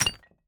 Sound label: mine stone